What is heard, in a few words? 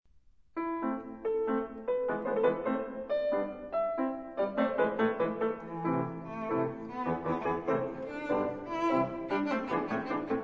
Beethoven Sonate A-dur Scherzo Allegro, cello